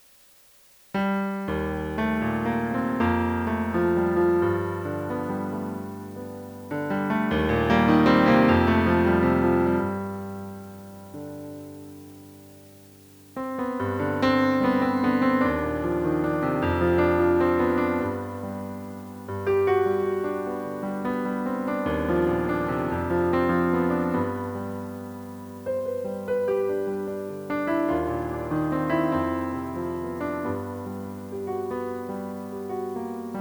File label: improv, pianist